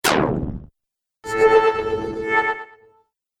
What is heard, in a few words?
Magic Spells; Magic Sound Effects